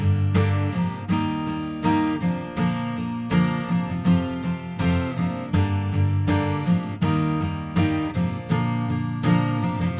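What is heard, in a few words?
no drums, instantaneous mixture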